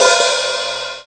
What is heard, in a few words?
Hi Hat Open Hard; GMPercussion; 3DO; Toolkit 1.5; GMPercussion22k